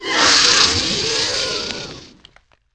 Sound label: DIE; mahlendur